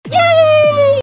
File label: yaaaay